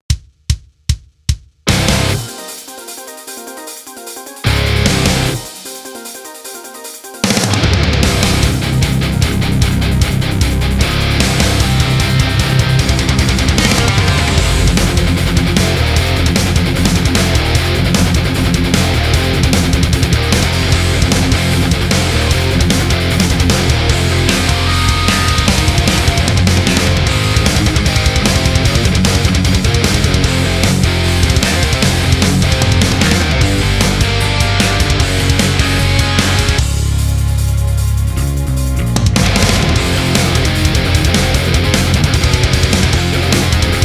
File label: Boss Theme Backing Track; MMBN3